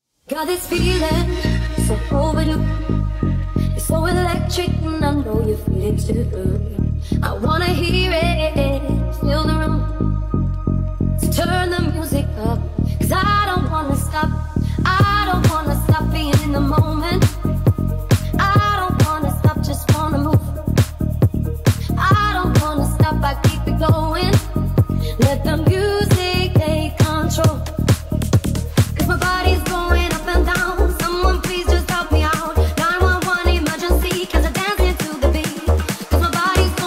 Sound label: remix